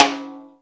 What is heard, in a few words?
TIMBL HI; Back In Time Records - Big Bang